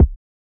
Havoc Kick, Kicks

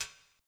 Isolated Hi Hat

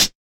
Closed Hats

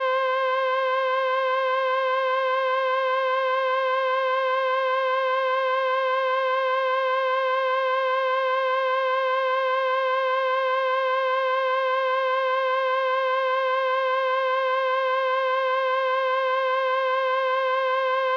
Lead, Synths

